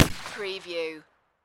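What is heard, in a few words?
Pistol Ruger 357 Single Shot Exterior At 45ft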